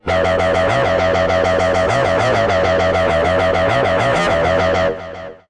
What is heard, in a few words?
Synth Mono